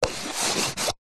household cup plastic empty slide upside down on table magic trick; zapsplat